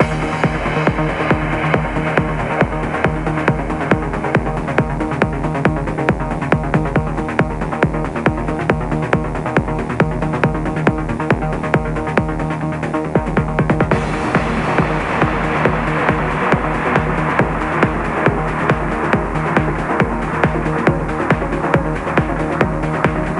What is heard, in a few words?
Jukebox Techno; missing the j.j. macfield and the island of memories